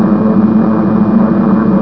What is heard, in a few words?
eng; BOMBER III™ (DEMO)